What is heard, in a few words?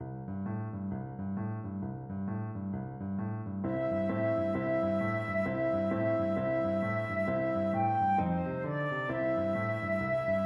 boogie flute